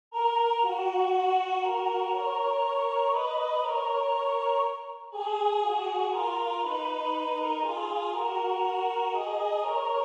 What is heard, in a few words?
Wiegenlied Tenor; M.Reger; Maria